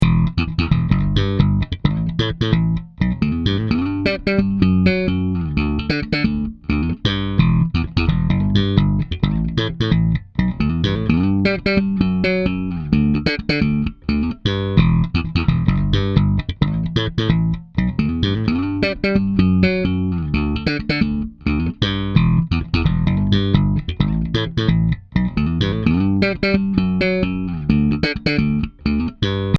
slap

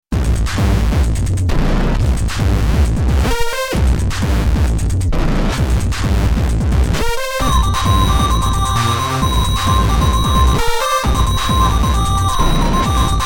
Hardcore Pattern; Venom

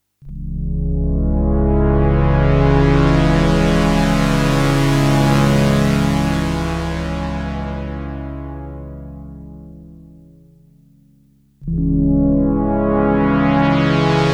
Filter Curtis 4pol Reso 0 Reso 5 Reso 10

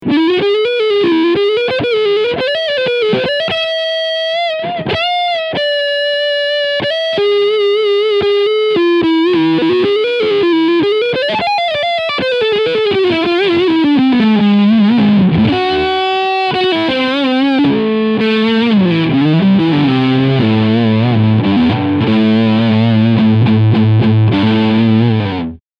Leadsound Front Humbucker

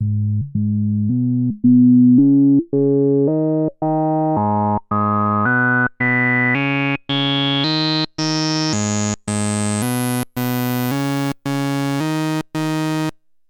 Genus 3 Poles; Rossum Electro Music Evolution

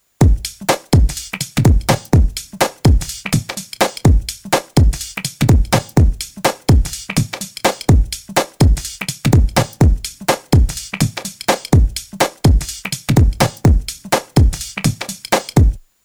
WES Audio Mimas Beat

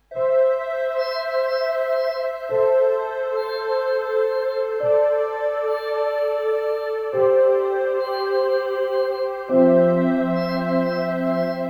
Kurzweil Forte SE Multi

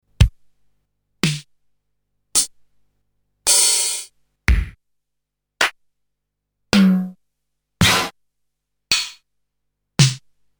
Korg DDD 5 Real

